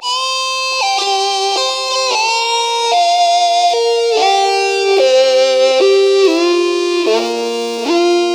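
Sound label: extra lead (melodic); Sonic Foundry (Sony Creative Software); Ugly Remnants VOL-2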